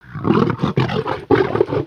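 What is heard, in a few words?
Pets Triceratops Ecstatic; creatures; Lego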